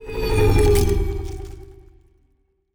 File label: pro ship colony move; biogenesis